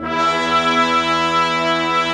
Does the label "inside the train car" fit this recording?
No